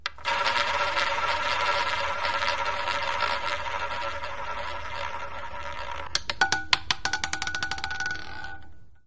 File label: roulette, spinner; eenhoorn roulette oostburg